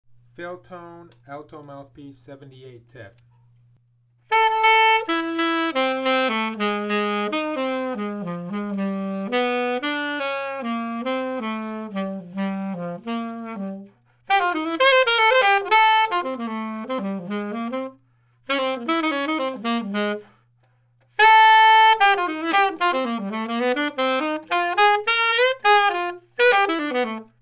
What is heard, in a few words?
Philtone alto